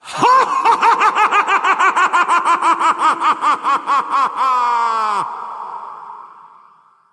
horseman laugh; headless horseman

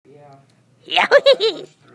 yahoo hoo hehe

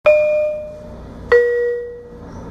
bing bong; lirr

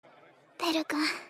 bell; bell kun

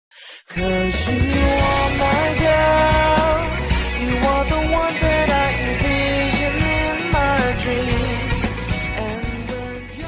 ending song

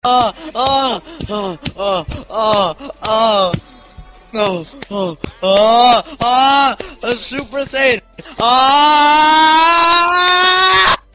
ahh ahh

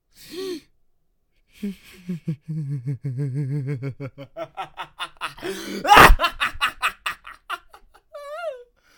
crazed laughter; mirage

